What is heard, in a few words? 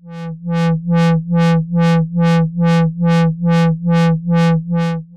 Amplitude Modulation